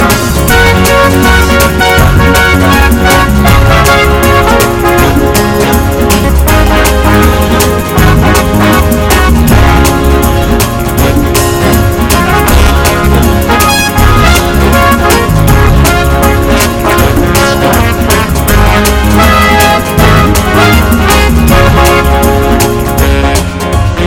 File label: Fast Rock and Roll